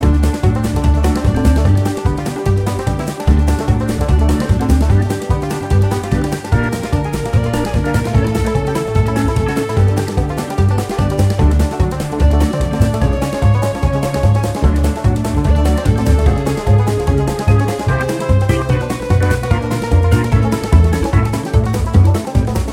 Music Timer Events; Calling All Mixels; 2014 mobile gamerip

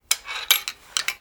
CROSSBOW RELOAD; guns n zombies

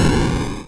SOUND ROCKET SHOT

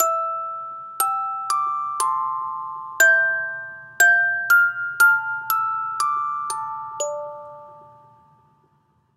Music Box; Assassin's Creed Syndicate

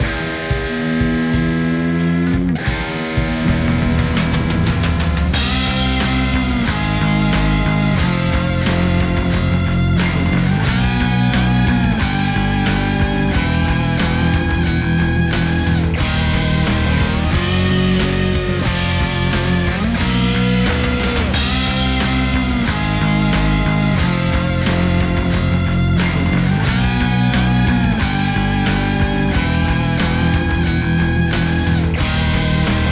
Opponent Goal; mario strikers football battle league; Yoshi

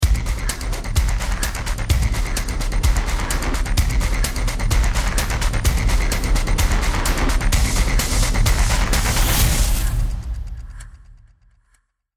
round ten sec count

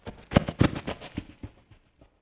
Running Fast; Five Nights at Freddy's; FNAF

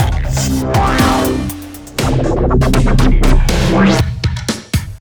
Taunt; Evos

